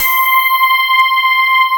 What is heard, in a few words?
sparkles